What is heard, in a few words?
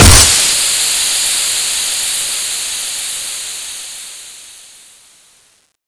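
m32 venom explosion, weapons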